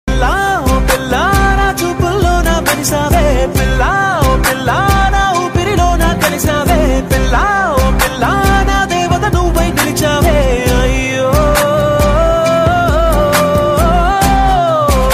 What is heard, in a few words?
Telugu love ringtone, romantic love song